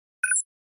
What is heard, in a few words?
Flash Beep Elect Puls